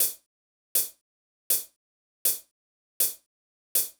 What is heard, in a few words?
chh lax; hydrogen